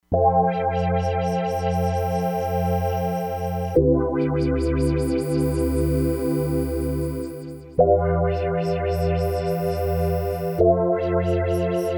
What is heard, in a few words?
MELLOW WOW, Crumar Bit 99; Factory Programs